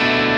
Bm7(11)

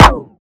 filter howl

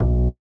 bass B2

